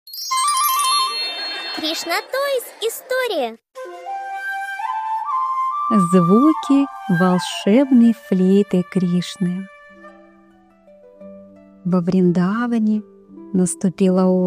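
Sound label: zvuki volshebnoy fleyty, magic flute sounds; krishnatoys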